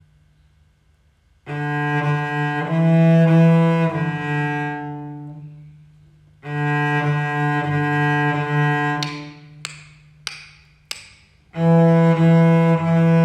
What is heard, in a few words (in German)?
arco Cello